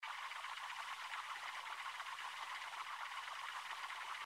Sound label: Spin; Chinese New Year Deluxe